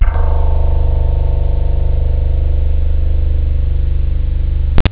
slap; jazz jackrabbit 2; M8

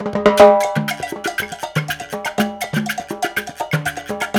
Mozambique, 120, African Contemporary; Spectrasonics; Supreme Beats

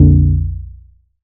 bass classic detroit F; Polyend; ARC Noise

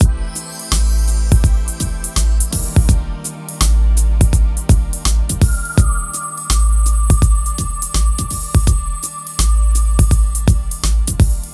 MIX, Hip Hop and R&B Drumloops; Best Service; ProSamples vol.01; AKAI

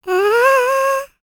LICKS, Vocal Planet Jazz FX; Spectrasonics

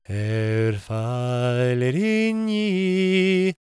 GAELIC, World Voices; ILIO; Vocal Planet

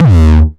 909 Grime Layer - D#2, Kicks; Mbase 11 Kicks

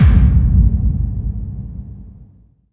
BD-FX, Dance Mega Drums; Best Service

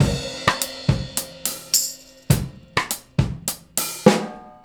Straight Kit, World Pop Beats

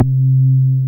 BAS Mini; Best Service Gigapack 2

